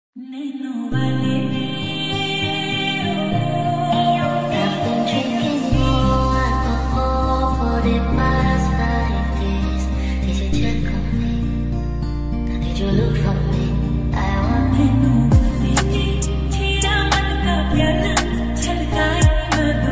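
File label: ringtone slowed and reverb ringtone flute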